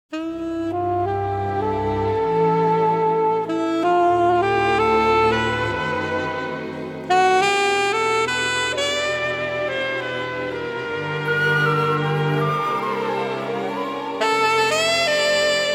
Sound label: Music Hall